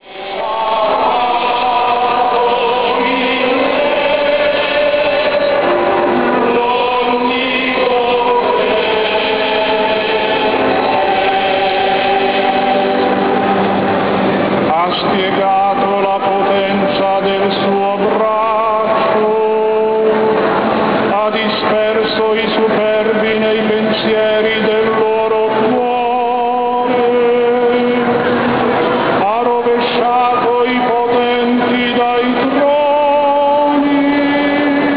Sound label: stpeters sing